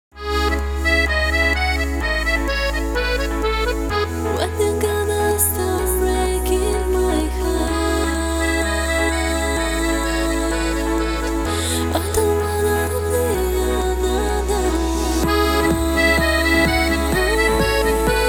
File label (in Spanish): Instrumentos musicales. Acordeoun